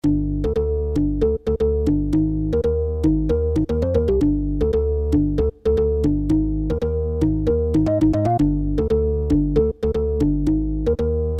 house organ, Korg N1R

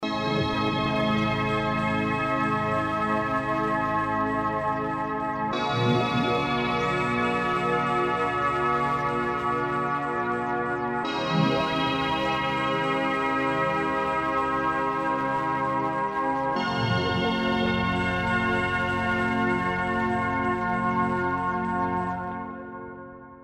pad resonance, roland jv880; water

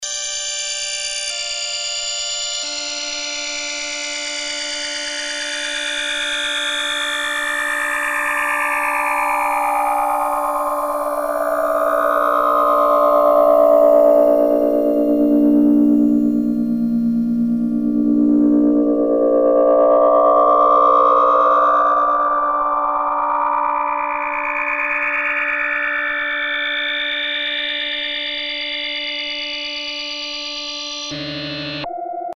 synth drill, Roland alpha juno

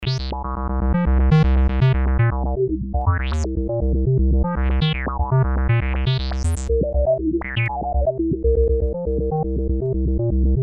bass; Emu XL1 Xtreme Lead; prodigy pan

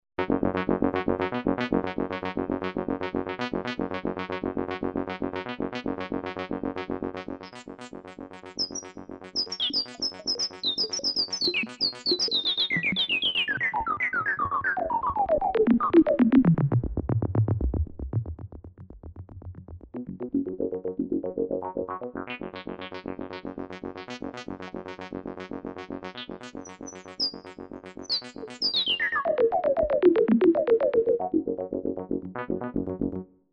rez, yamaha tg500; Synth: resonance

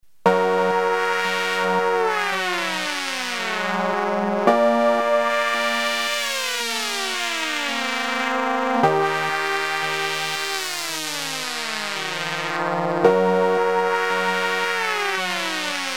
raw, redsound darkstar

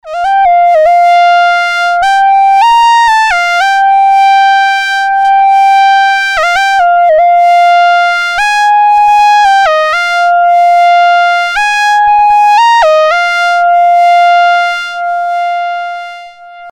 mono lead, novation bass station 2; analog monophonic machine with subtractive synthesis

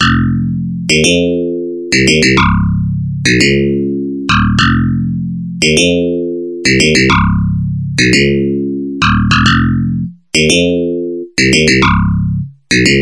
bass, yamaha tx81Z

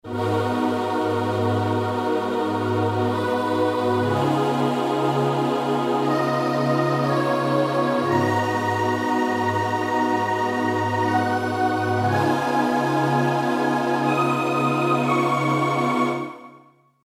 choir, korg n1r

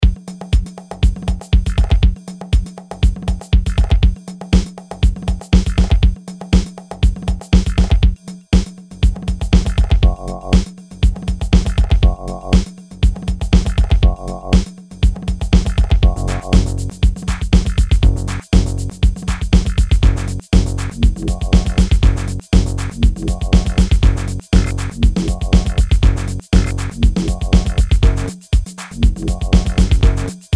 Kawai r 100; drum machine based on a 8-BIT M50734 SP which manages 8 voices polyphony; SuperMix: Kawai R100

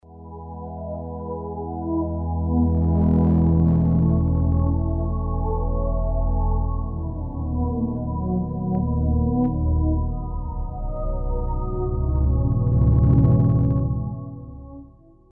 pad phaser, Pad: phaser, Roland JV2080

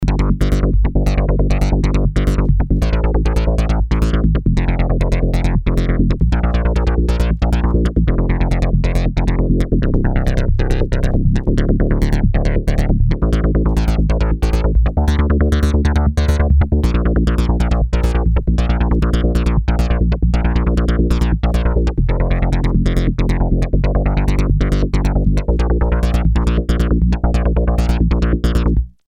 bass razor, synth Roland alpha juno

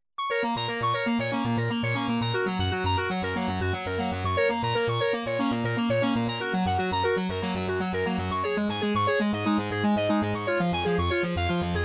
synth delay, Yamaha TG500; Synth: old CBM64

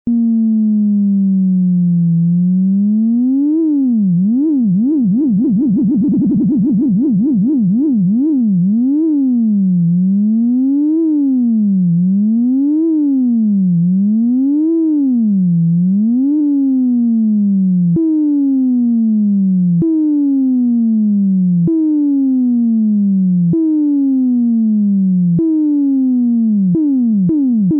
xp all lfo, roland jv880

